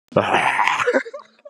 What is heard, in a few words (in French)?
grogne